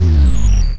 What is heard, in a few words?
Scifi Dome Shield Deactivate; Energy & Forcefields